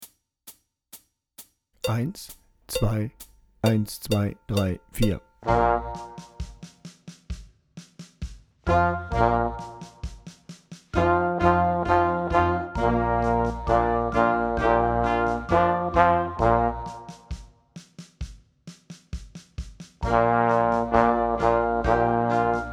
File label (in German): Posaune; lauter